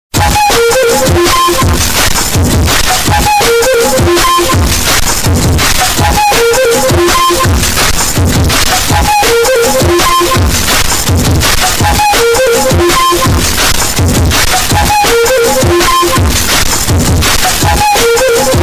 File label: Distorted